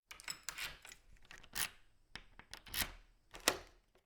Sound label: opening door with key